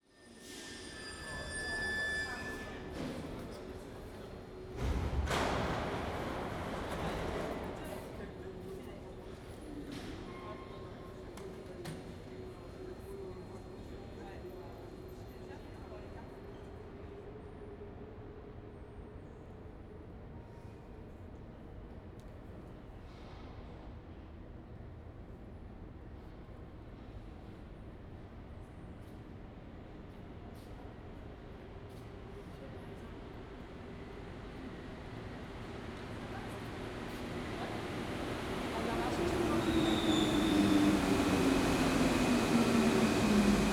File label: underground dock metro passing, FRENCH SUBWAYS; Chupapsound